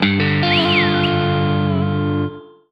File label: Jingle Menu Op; clubhouse games 51 worldwide classics 2020 switch gamerip